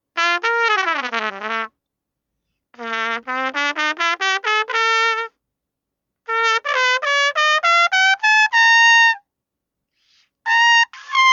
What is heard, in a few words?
tone; jettone